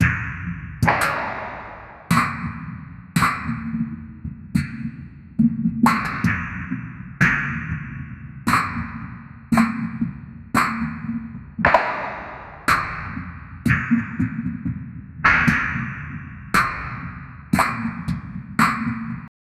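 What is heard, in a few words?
a balanced rhythmic mind m, brainwashing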